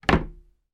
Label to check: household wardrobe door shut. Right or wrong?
Right